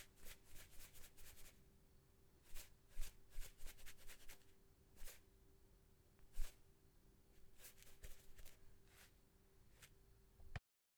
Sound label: Spice Shaker